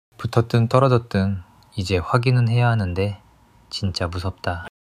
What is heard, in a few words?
struggle long struggle